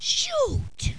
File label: shoot